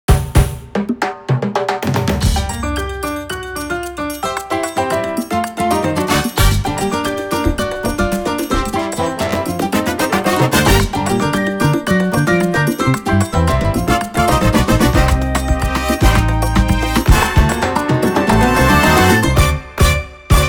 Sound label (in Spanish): Abanicos instrumental